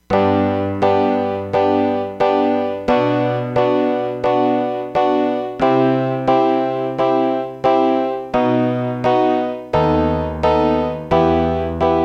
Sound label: Piano